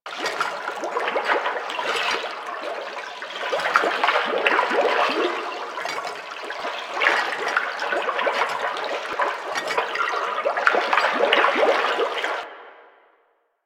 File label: Vial Hazard Bubbling Poison Loop; Entrati; Hazards